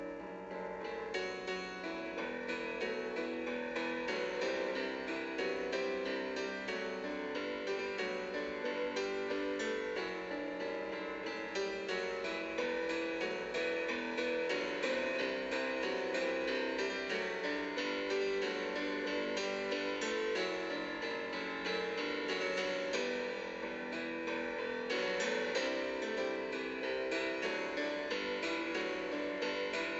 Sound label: piano cover